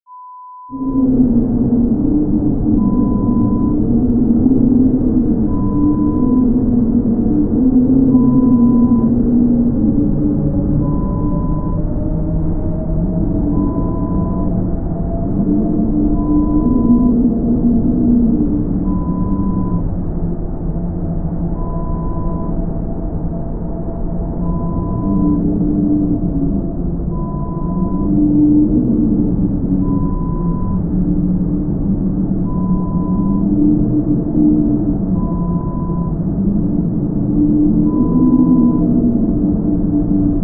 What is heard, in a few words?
Howling Wind sound